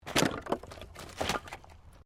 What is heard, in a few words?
Wood Movement Minor